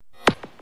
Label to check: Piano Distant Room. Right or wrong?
Wrong